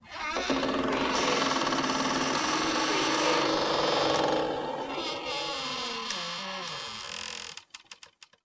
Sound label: Creak Long